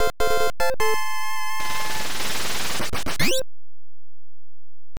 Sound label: Stage Clear; Yoshi; NES; Type-B